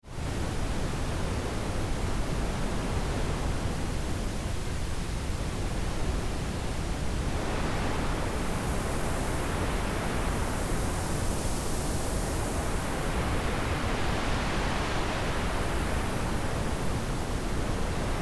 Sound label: wind